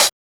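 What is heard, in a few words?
HH, hi-hat; X-Static Goldmine 1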